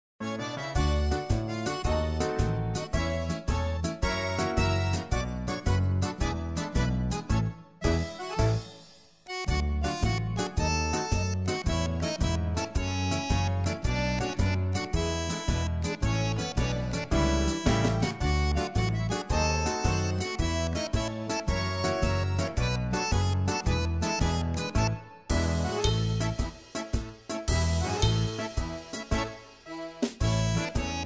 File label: Instrumental